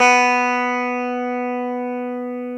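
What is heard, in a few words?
E 12 String guitar; Club-50 - Foundations Roland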